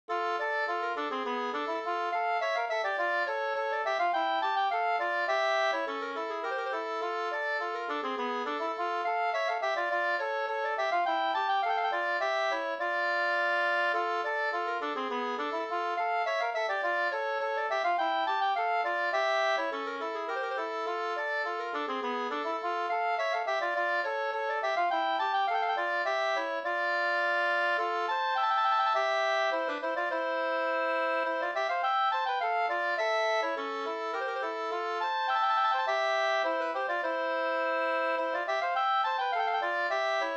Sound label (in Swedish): polska, 2st; Bytt-Lasse SvL HG45